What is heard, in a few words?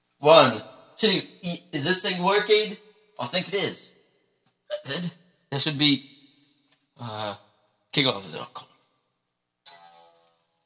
Guitar Warmup